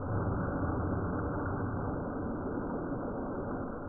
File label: WATER; 7realms; interactivefiction